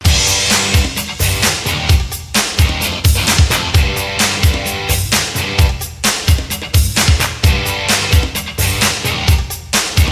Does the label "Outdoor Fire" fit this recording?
No